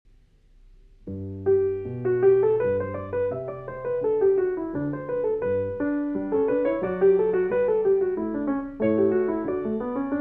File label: Sinfonia In G Major; 15 Sinfonias; J.S. Bach